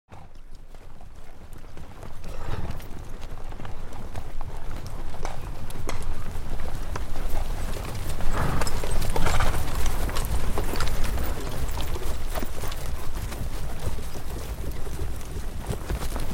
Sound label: Horses Walk By; Historical; Hollywood Edge Historical Series; War Horses Armies & Weapons